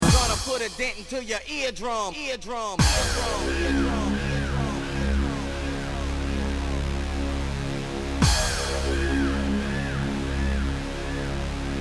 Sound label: Ear Drum